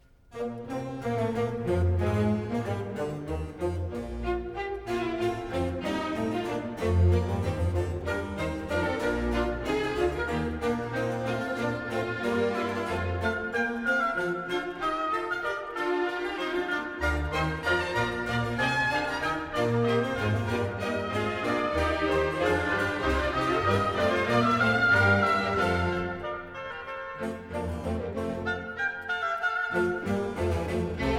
Concerto a due chori in B flat major, Alla breve moderato; HWV 332; Handel Masterworks; Klassika